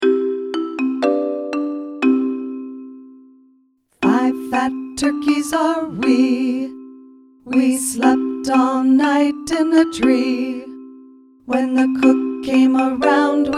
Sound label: FIVE FAT TURKEYS VOCAL